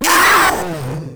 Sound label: Proto Infested Runner Pain, Vocals; Warframe